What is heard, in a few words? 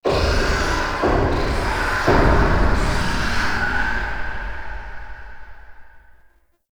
Murmur Knocks Cries; Warframe; Tau; Cinematics; LastBreath